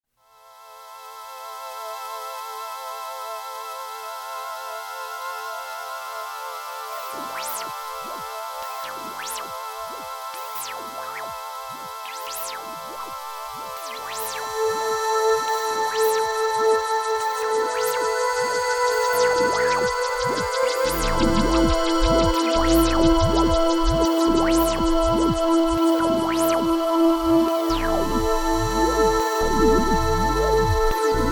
kawai k3 pure ambient

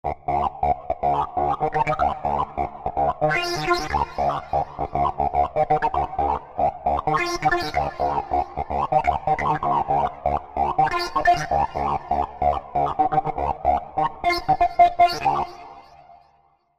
sk xk-322b sounds external fx

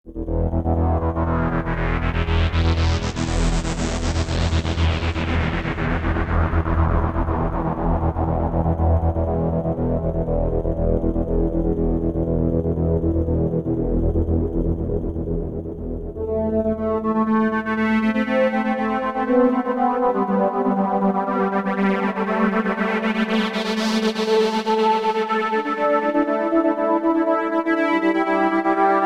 redsound darkstar sounds, external fx

